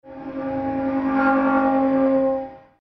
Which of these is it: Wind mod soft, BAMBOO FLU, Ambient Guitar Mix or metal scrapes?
metal scrapes